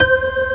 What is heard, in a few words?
Rock Organ (C5); 3D Ultra Pinball Demo